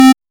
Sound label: 8 bit chiptune pixel shor